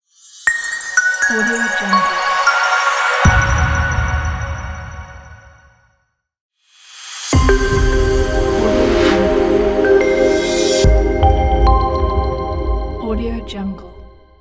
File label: digital design logo pack